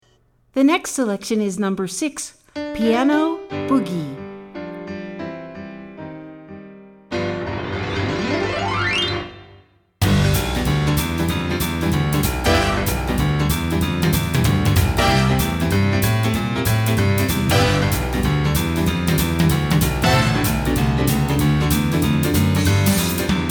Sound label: Piano Boogie